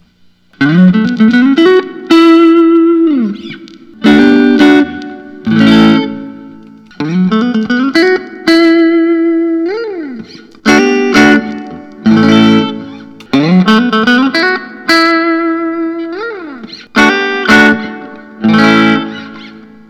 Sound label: fender telecaster natural